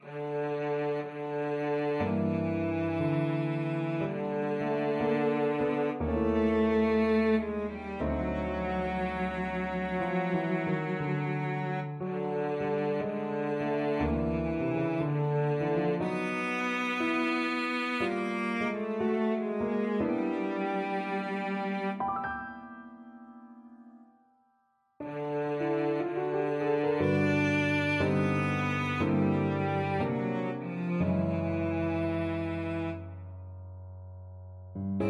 jazzy, cello

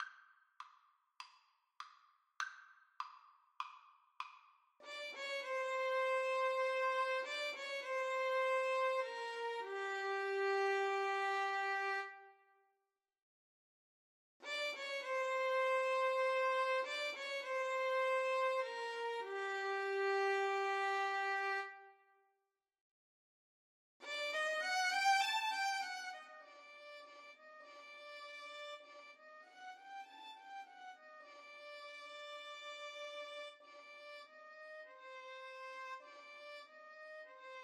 Violin Duet

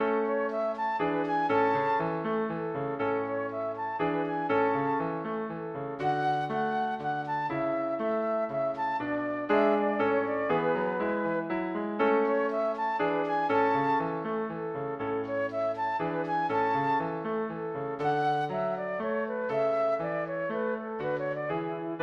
bach minuet a, flute

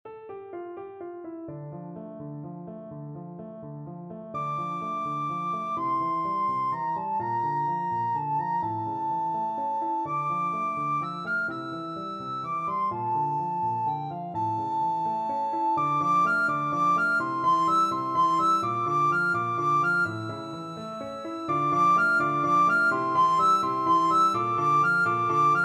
spanish violin, recorder

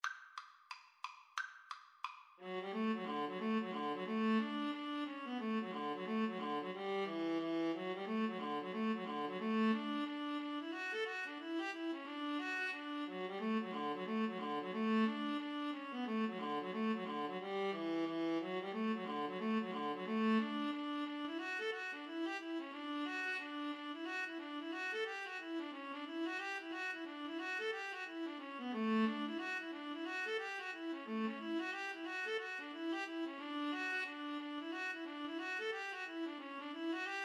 Viola Cello Duet

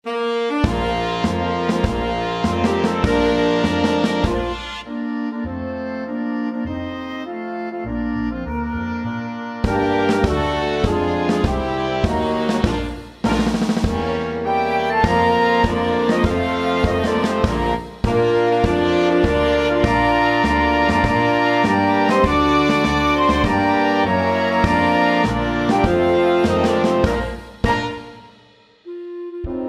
wind band

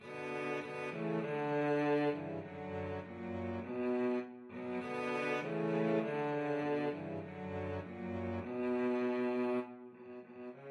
cello trio

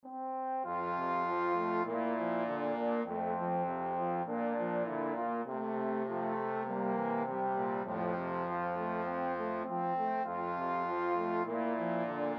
blue bells scotland trombone

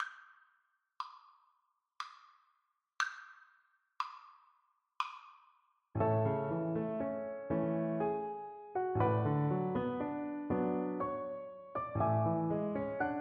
puccini donna violin

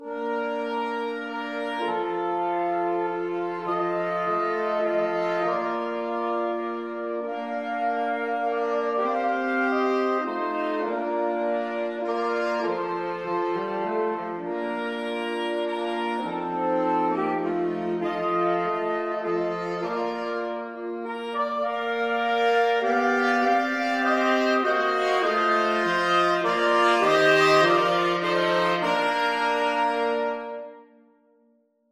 mozart kyrie eleison, saxophone quartet

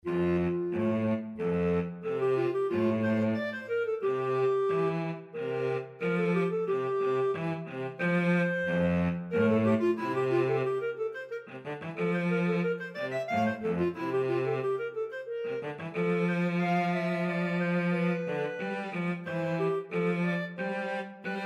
clarinet cello, clarinet-cello duet

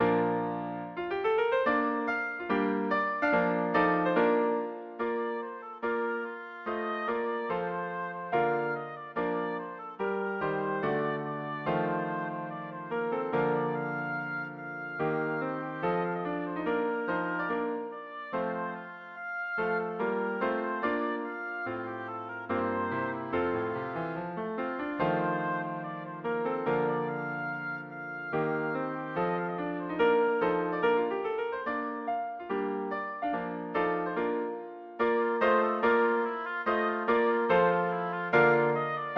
schubert lute, oboe

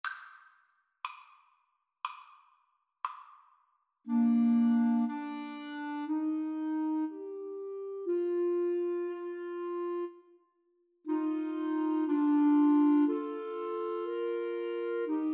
Clarinet Trio

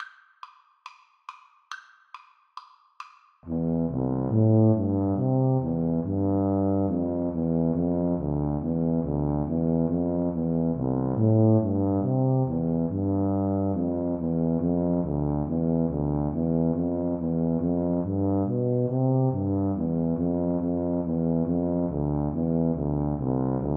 Tuba Duet

